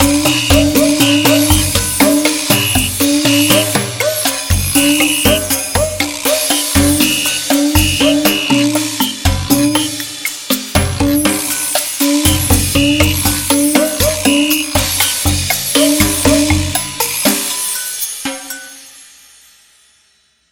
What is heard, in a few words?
Drums; This is a random test of a drum with so many notes playing all at once